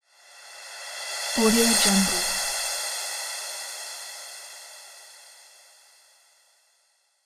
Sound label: cymbal swoosh